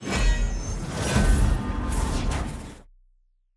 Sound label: loot portal open promotion outro